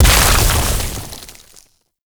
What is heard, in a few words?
Plunge Ability Ice Explosion; Enemies; Abilities; Warframe; Duviri; Dax Knight